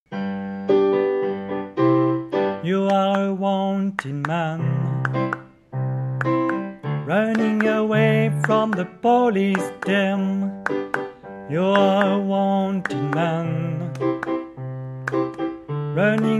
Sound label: Soprano